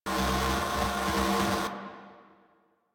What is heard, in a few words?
Democracy Fire Loop; Warframe; Caliber Chicks Minigame; Weapons